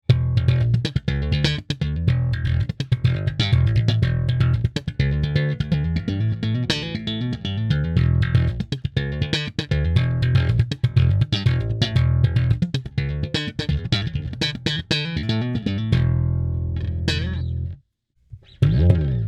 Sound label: P Bass slap clean drive